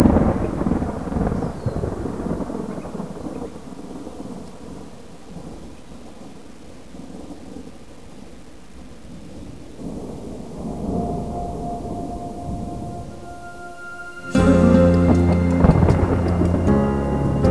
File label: Thunder